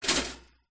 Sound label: household toaster pop up